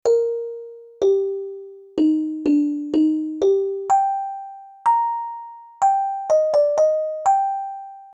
xylophon loop 125 bpm

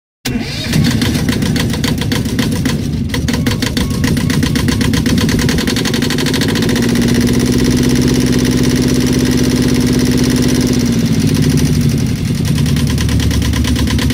Boat Motor Sound Effect

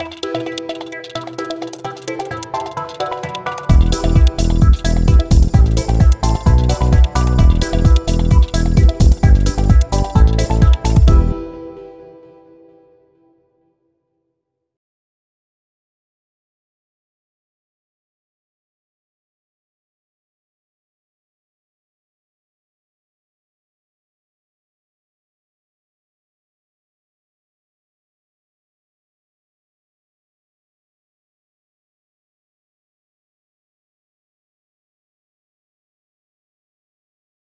Jingle TV

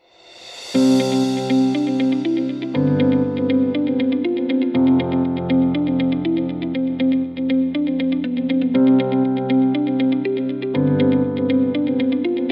the upbeat inspiring corporate

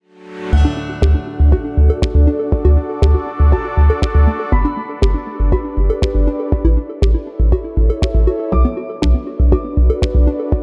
corporate music audio logo

